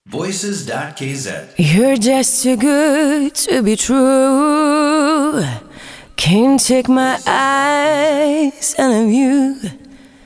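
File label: English vocal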